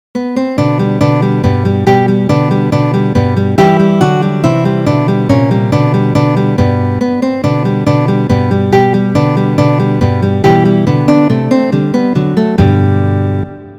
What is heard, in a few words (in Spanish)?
contradanzas; Ferdinando Carulli